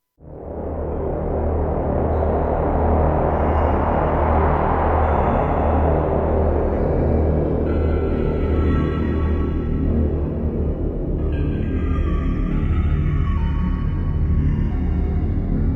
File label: ominous goings-on